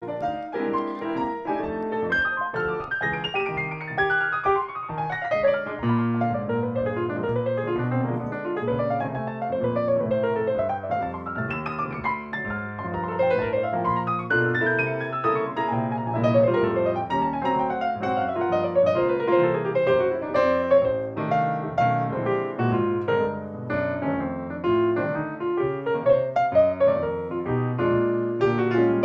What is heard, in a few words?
Blues